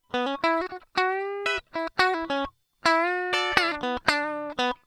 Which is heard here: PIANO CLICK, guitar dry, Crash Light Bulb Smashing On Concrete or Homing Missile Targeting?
guitar dry